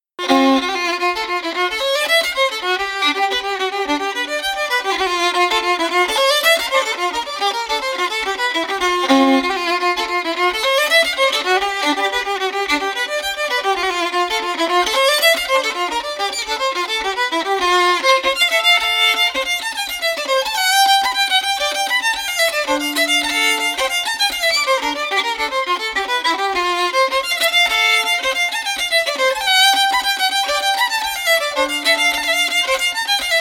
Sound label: reel, fiddle; archie menzies